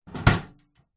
industrial metal toolbox with tools inside set down concrete